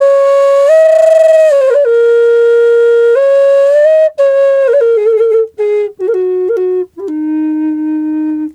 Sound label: EF wild flute; Big Fish Audio - Didgeridoo and Other Primitive Instruments